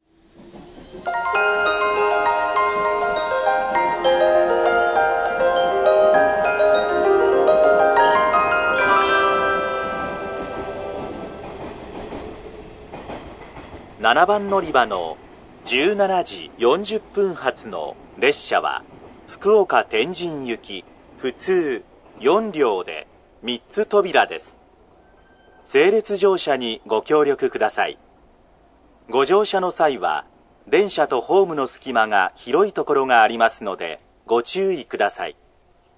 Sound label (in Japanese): ○発車放送+サイン（急行・福岡（天神）） 殆どの列車が時間が無いため、ドアが開く前から発車サイン音が流れます。; 二日市駅; 7 天神大牟田線／大橋・薬院・福岡(天神) 方面; ○メロディー+次発放送（普通・福岡（天神））; 上り副本線。退避列車や始発列車が使用します。; 二日市駅 -ふつかいち- Futsukaichi Sta.